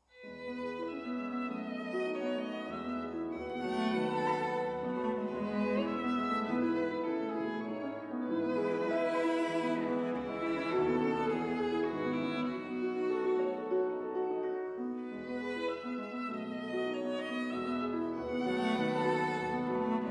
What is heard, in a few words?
Piano Trio; tchaikovsky piano trio in a minor op 50